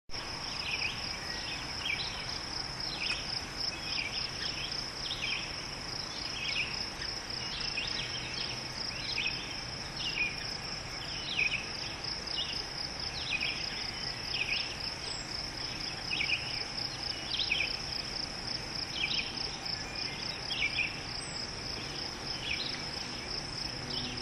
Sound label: Meadow, nature